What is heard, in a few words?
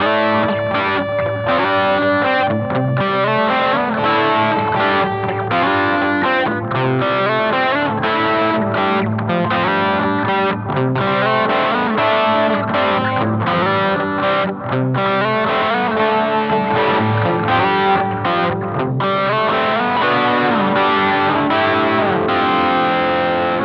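Crunch; Boss GT-1000 Core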